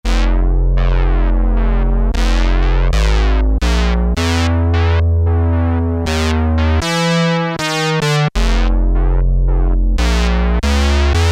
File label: Ghost LFO Pad, Roland JX-3P